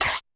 Korg 01W MegaDrums; 300 Drum Machines

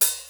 GROOVE KIT, ENSONIQ TS-10

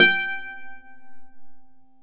Celtic Harp

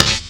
bass drum; Zero-G - Chemical Beats; BASSDRUM ZOO

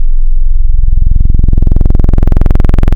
Classic No Res, wavetables; Waves Codex

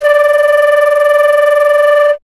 flute D

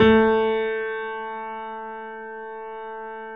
GRANDP ST A 2 F; USB Soundscan vol.17

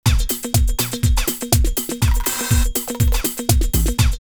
122, drumloops, Techno&House; X-Static Goldmine; 90 s Sample CDs

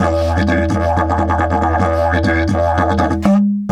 E 130, didgeridoo

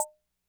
Perc, classic machines; arranger

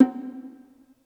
C# Bongo L, Yamaha PSR E305

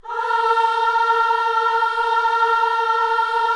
KIDS CHOIR, Gospel

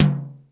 DRY TOM L, DRUMS; East West - Global Sounds

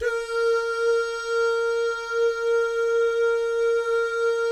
DOO GUYS, Vocal Jazz FX; Spectrasonics; Vocal Planet; JAZZCOMBO

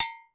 Percussion, Korg 01W; 300 Drum Machines

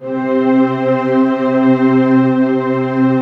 symphonic C3

